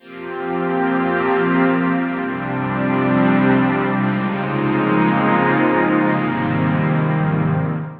Grand High Pad; Sonic Foundry (Sony Creative Software); The Electronic Music Manuscript Collection

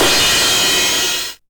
909 CYM Tape Sat; Goldbaby Tape808 & Tape909; The Tape 909

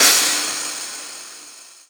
Crash, Cymbals

